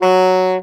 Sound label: A SAX POR F#2, Alto Sax Portato; Orchestral; VarazdinOrchestral